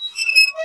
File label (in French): Levier ou roue qui couine